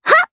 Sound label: Vox Jump, jp; voices heroes jp; Annette; kingsraid